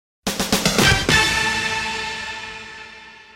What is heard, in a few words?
Drum Into Bass